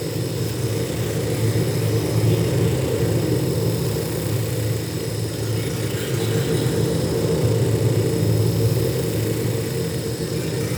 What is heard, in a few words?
Large Ramp Hall Tech Ambient Loop; Warframe